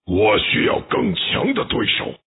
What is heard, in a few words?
fish die